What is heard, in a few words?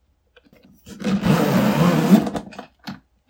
Toolbag zipper open